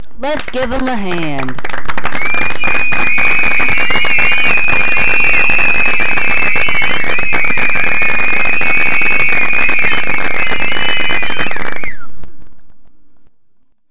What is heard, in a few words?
Clapping